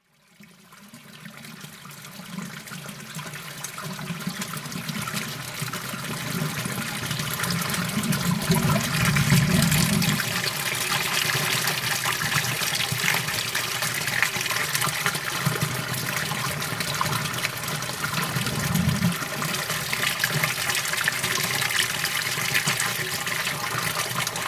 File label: Water, 自然音研究室